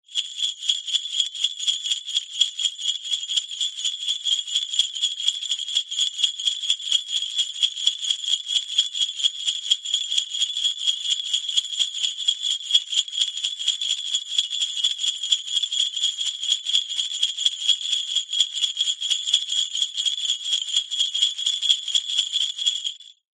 musical large sleigh bells med shake

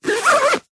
foley luggage rucksack backpack pocket zipper; zapsplat

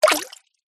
multimedia game designed water splash

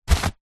foley balloon foil helium grab hand pick up

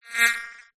cartoon fly pass by; zapsplat

